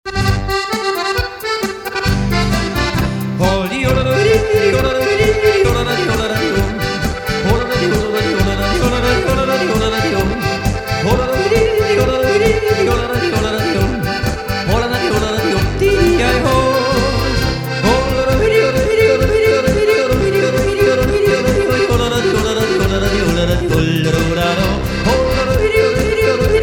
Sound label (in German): Solo Jodler